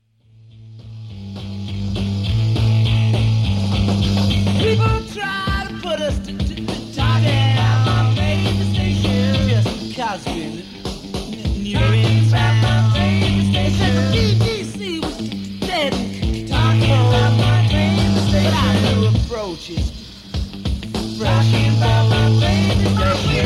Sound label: RADIO JINGLES